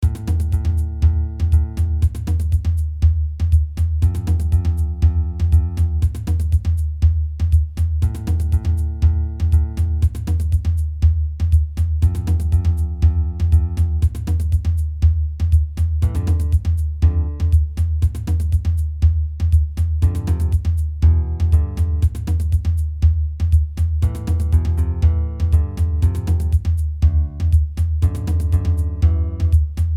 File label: bgm percussion theme